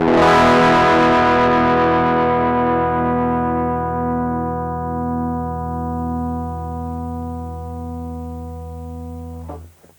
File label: amp squeezer heat, overdrive